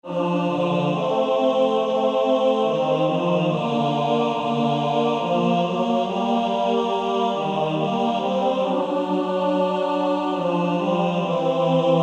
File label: Male Choir TB; Jesus Calls Us